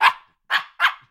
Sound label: dog voice; anemoi